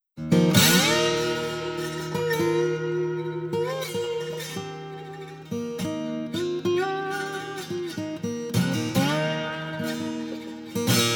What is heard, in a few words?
Silver Slide Moan; Music Lab - Voices Collection 2